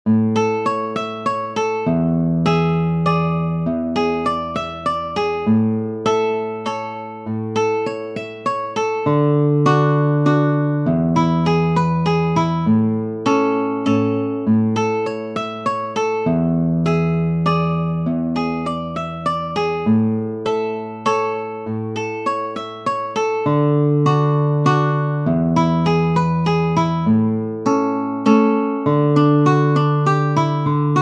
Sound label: Petit Menuet; francisco tarrega